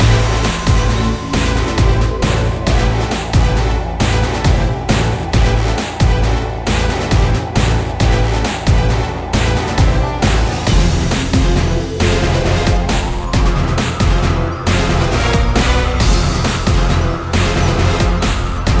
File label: fs Boss Ambience; wild worlds